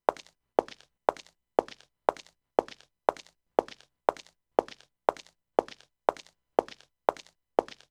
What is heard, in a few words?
Footstep middle